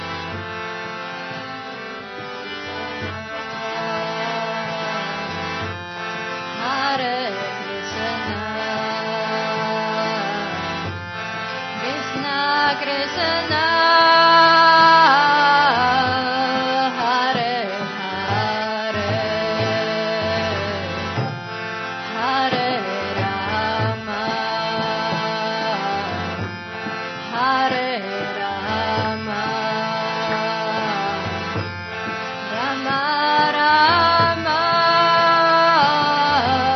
Bhajans Kirtan Hare Krishna Kirtan; ISKCON Slovenia; Holy Name Festivals; Kirtan Waves

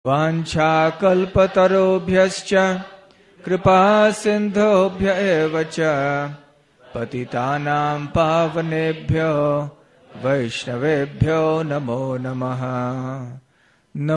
Sri Vaishnava Pranama Sung; Pranam Mantras

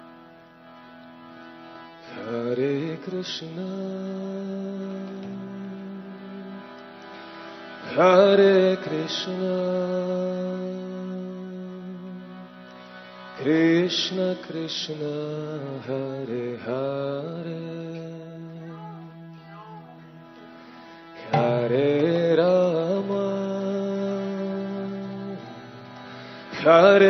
Bhajans Kirtan Hare Krishna Kirtan; ISKCON Slovenia; Holy Name Festivals; Kirtan Waves

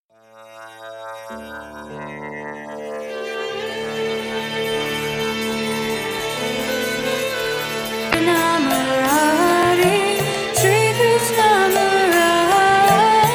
Temple Bhajan Band; Bhajans and Kirtans Classical and Western

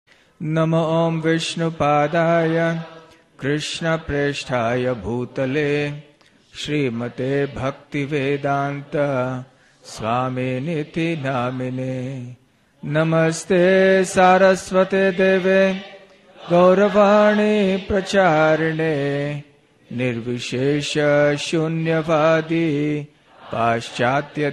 Srila Prabhupada Pranati Sung; Pranam Mantras

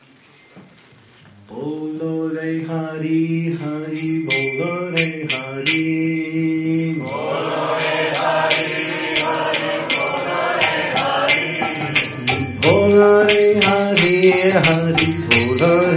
Bhajans Kirtan Hare Krishna Kirtan; ISKCON Slovenia; Holy Name Festivals; Kirtan Waves

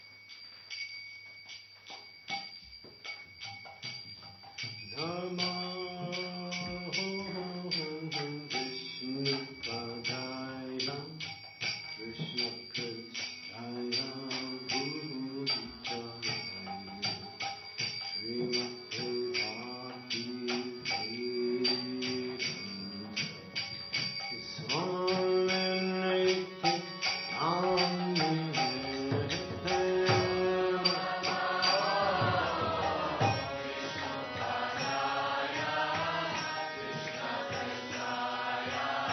Bhajans Kirtan Hare Krishna Kirtan; ISKCON Slovenia; Holy Name Festivals; Kirtan Waves

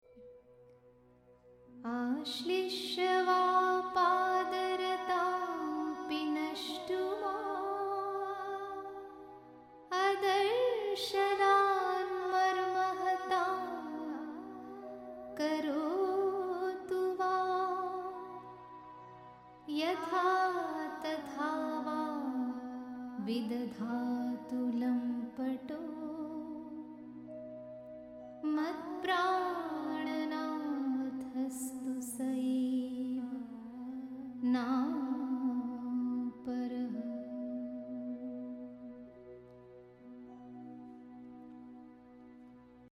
Background score for drama; Gopals Studio

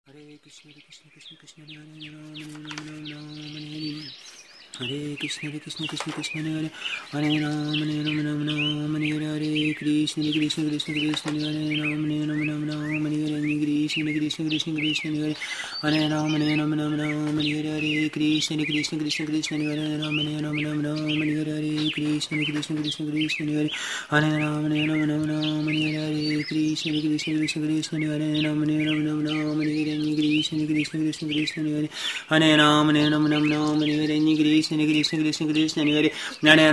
Japa with birds singing; ISKCON Swamis; His Holiness Bhakti Vijnana Goswami

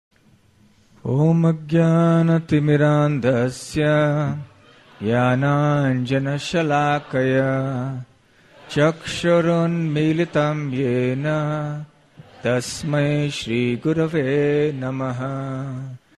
Sri Guru Pranama Sung; Pranam Mantras

